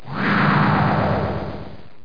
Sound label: spell; landslor